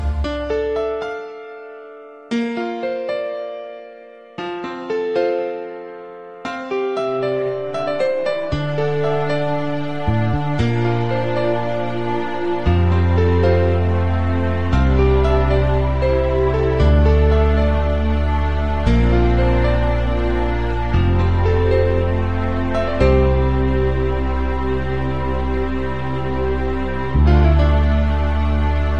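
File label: instrumental; zedge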